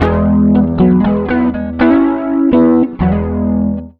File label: rock, acoustic guitar loops